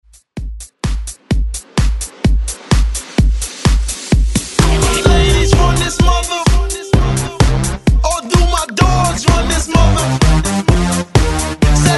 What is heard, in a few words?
Hype ReDrum 128 Bpm